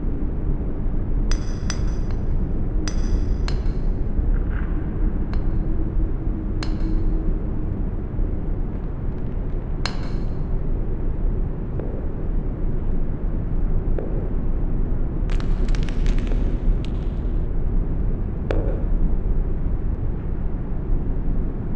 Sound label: amb wild west mine; skillrun3